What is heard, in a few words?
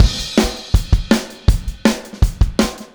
Take Your Toms Off - Chorus - Full Mix, Drums, 162 BPM; Drums from the Big Room The Mixes; Chorus 06